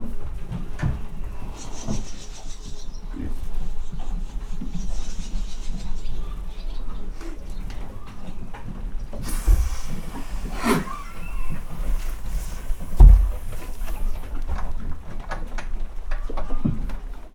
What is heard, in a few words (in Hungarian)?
szellent nevetes; állathangok; paraszt udvar